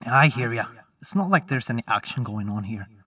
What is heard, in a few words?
city grunt